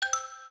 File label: naivety, notifications; OnePlus 5T Stock Ringtones